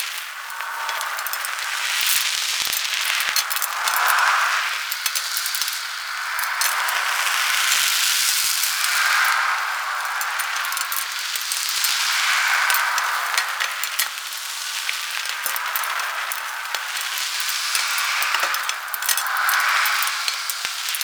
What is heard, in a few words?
Hub Sword Loop; Ambience; Dungeons; Under City; Champion Crypt Hub; Fenn Jotar